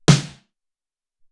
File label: Krk Snare, Alesis SR-18